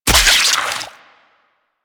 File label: Wyrm Bait Burst; Warframe; Infested Eris